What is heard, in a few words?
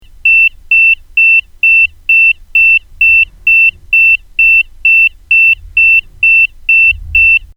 fast pulse; Transducers USA